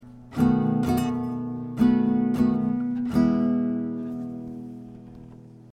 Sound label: seventh, guitar chords; Cowboy Guitar; Seventh to Major